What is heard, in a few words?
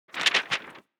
mail recycle